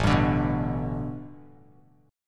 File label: A 9th Suspended 4th Bass Standard, chord; A9sus4; strummed; JGuitar